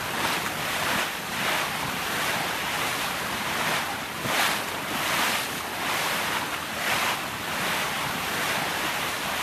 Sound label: ambient port; World Of Warships; port3d